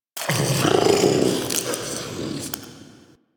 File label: Interior Munching; Warframe; Infested Eris; Misc Indoor